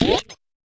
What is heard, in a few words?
fx drum, Electro FX